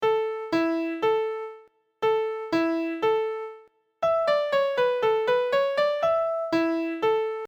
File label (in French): Mélodie anglais